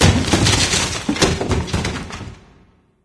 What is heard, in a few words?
wood debris; ambient; Left 4 Dead 2; Source Engine; random amb sfx